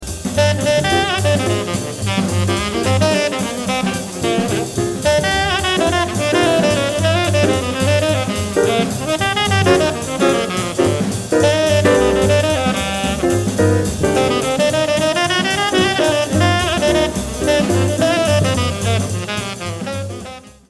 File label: solo